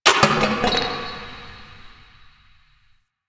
Subway Garbage Amb Misc; Nineteen Ninety Nine

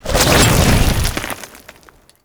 Spawn Foley; Enemies; Attacks; Warframe; Grineer Ghouls; Ghoul Devourer

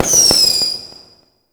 Firework; Warframe; Fireworks

